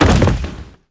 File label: Footsteps; unreal development kit